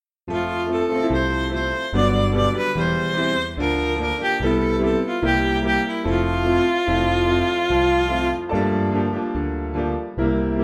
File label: Midi